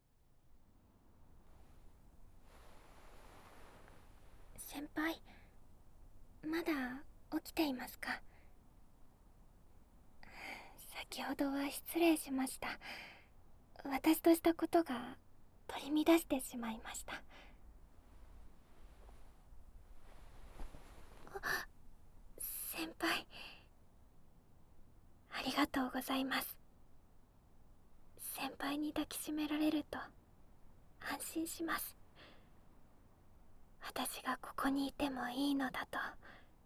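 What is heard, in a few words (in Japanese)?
吐息・寝息・心音; Track8 一緒に眠りましょう【吐息・寝息・添い寝・心音】; 大好きな彼女に もう一度会えたら 今度こそ離れない。【耳かき・料理音・添い寝・寝息】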